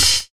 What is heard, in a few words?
O HAT; E-Lab; X Static Goldmine 2; akai